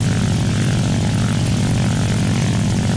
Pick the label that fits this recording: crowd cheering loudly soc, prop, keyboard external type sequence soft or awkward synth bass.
prop